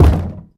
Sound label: underground cube soft